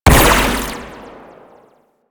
Laser Fire; Amalgam Carrus; Warframe; Abilities

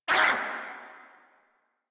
Attack Effort; en; Warframe; Grineer; Jester

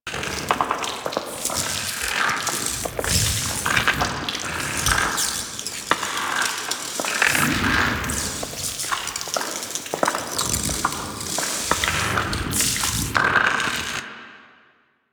Void Ooze Small Loop; Ambience; Props; Warframe; The New War; Zariman; Quests

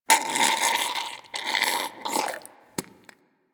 Goop Squeeze Close; Ambience; DE; Warframe; Infested Eris

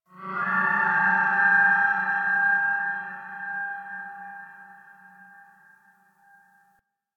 Spirit Chatter

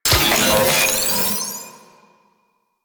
Password Glyph Pickup; Warframe; Veilbreaker; Quests; Kahl

